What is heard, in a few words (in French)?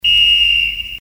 Sifflet football